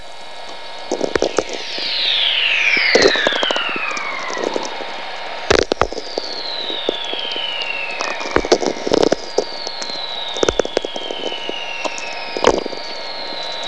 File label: VLF whistler bouncing ionosphere echo trains